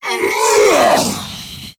attack; tf; slender; shaolinshuffle

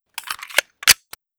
pistol reload; weapons; hl2dm

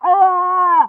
pain severe; pyro

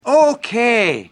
chase; shrek

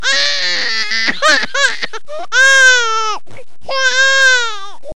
baby

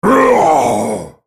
spellcast effort; Volibear

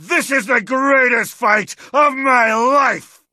cheers; tf

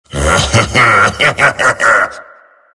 laugh; tf; skeleton king

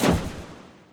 dash; serpentine

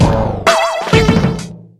failure; tf; microtf2; wario

